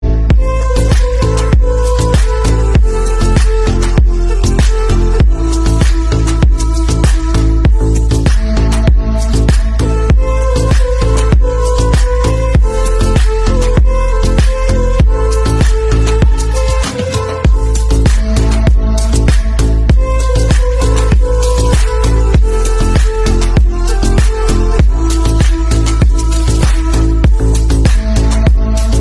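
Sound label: best turkish music ringtone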